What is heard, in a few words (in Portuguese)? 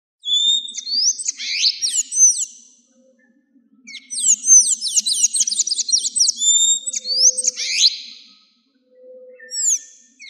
Canto do Sanhaco Azul